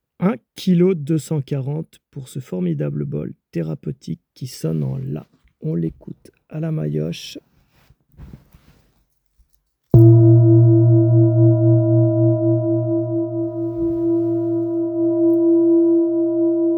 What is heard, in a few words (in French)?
Bol Tibetain Martele en Alliage 7 Metaux Therapeutique Note La 22 cm